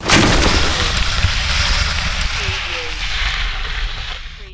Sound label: Skiing, Snowboarding, Landing after jump, slide in, stop